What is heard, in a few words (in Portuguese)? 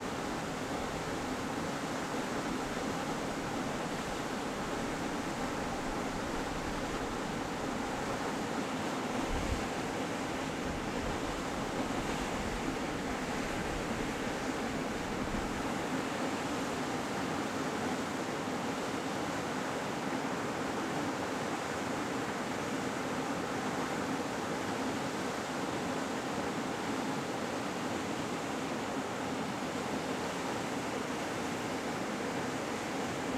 Cachoeira de sao bento som diferente e bem pouco vento grave; Cerrado